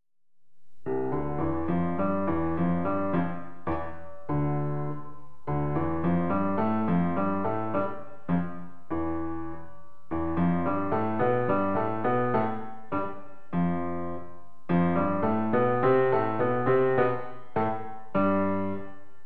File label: Keyboard Pattern